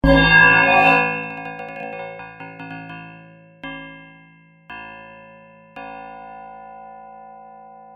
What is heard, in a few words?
Hybr Pno Memb